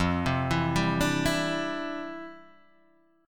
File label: C Major Add 9th, F, chord; arpeggiated